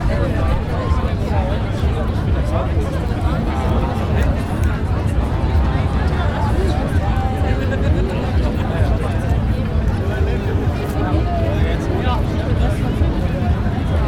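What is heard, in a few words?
party crowd gathering festival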